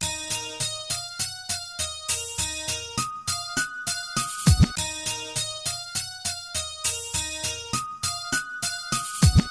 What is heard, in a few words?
Hip Hop Guitar